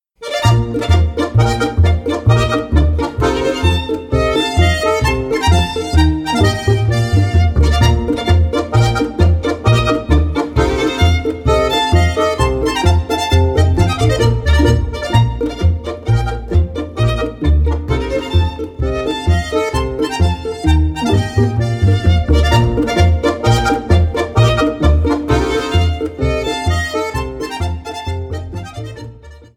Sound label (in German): polka